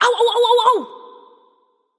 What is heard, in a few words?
ouch; smite; pele